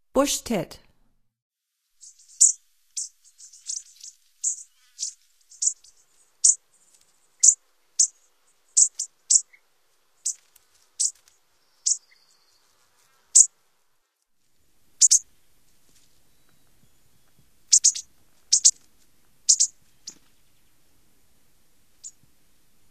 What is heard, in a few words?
Bushtit, Songs of Birds of North America; Stokes